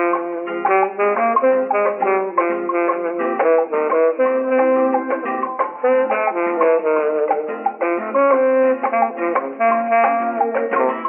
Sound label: sax, Loops; Phonky